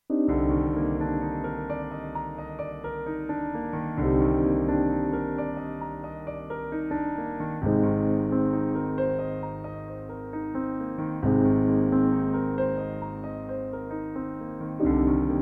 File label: backing sunset rain